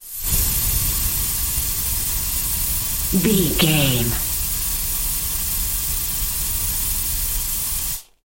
gas leak long burst

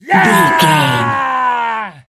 voice male battle shout long

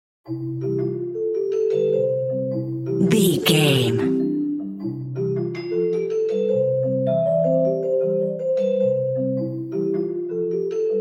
celeste; Humpty Dumpty French Celeste

